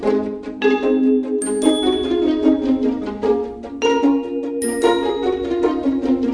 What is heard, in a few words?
Game Music Bonus Mode Mono; Bubble Witch 2 Saga